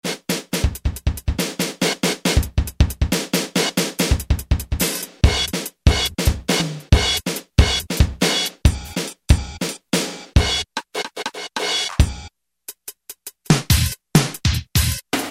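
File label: Short Drum, Roland U-110; Factory Patch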